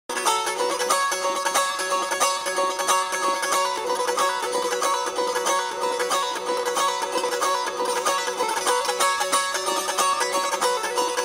dutar

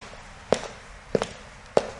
Shoe Walking Sound Sms Notification; sandal

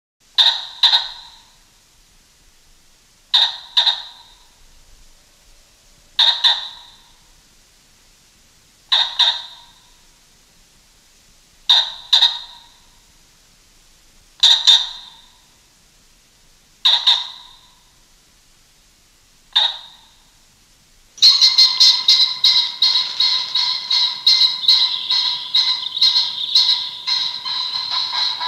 american pheasant sound

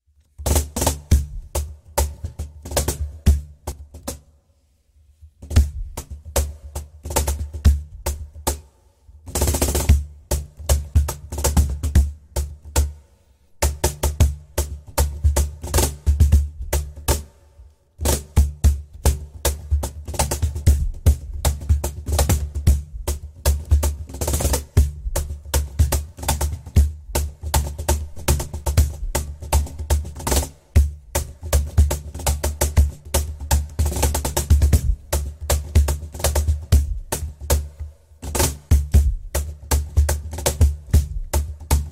Cajon

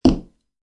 wood step

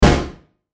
box falling down; Falling on floor

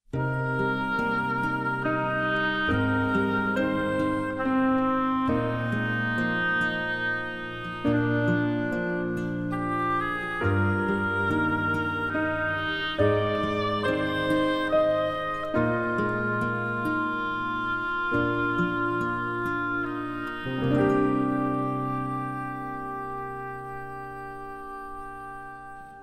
Home (Instrumental); Ip Man 4 The Finale

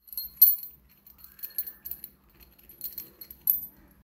key chain

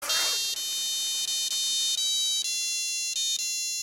Cell Phone Ring; Kill Bill Vol. 1 2003

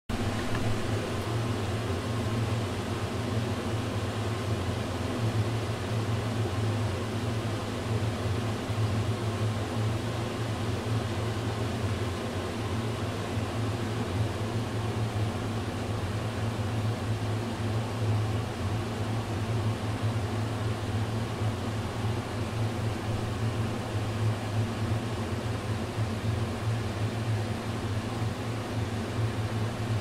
Kitchen Hood

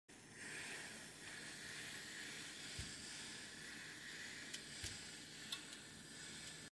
steam iron

